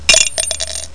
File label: My Coin Drop; Lab-Rat v2.0